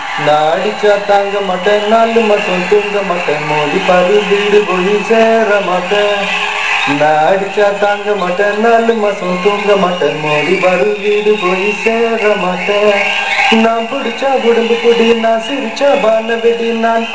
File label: Singing